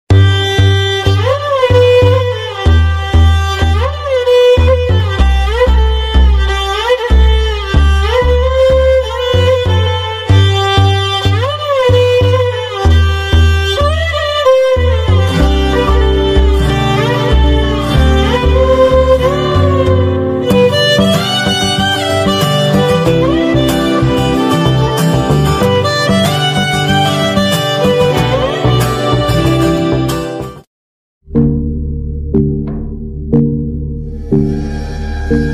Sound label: bgm tracks bass bosted